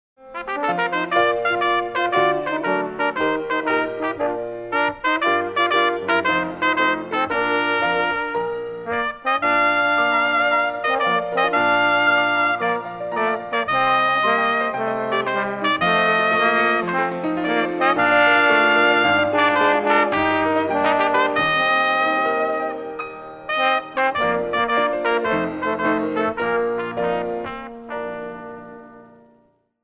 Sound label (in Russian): Музыка; Wenn des Herrn Posaune; Общение по БВ Эсслинген